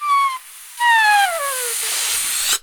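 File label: Balloon deflate squeak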